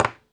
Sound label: click; Gomoku Tapa Game